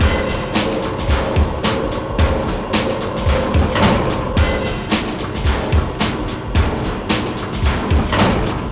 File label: Fight